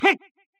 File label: jump; goemon